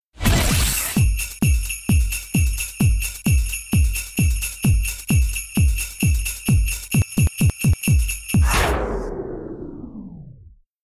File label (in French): Base rythmé